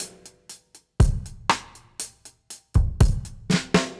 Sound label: Dub Drums A Dry 120bpm; musicradar